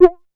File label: QUICA, DRUMS; Music Store Sound Service; Einstein